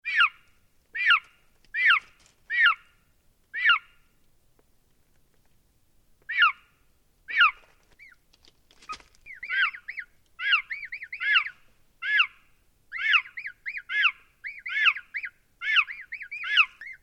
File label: Chirp Chick Chirping - Newly Hatched Chick Chirps; Chickens; Animals; Tom Hutchings